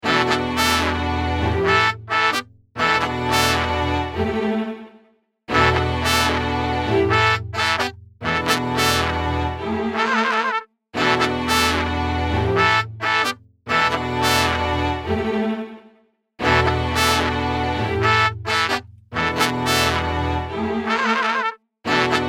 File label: horns